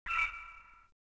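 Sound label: ruins ambience; poolofradiance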